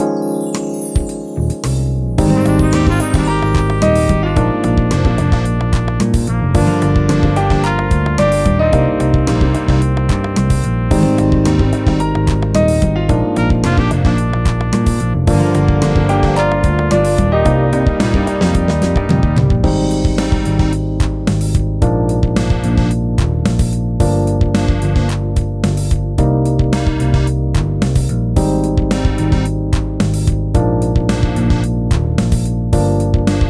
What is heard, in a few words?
110bpm E♭Maj